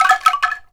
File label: ANKLUNG DO; ILIO; Synclavier Percussion 2; World & Orchestral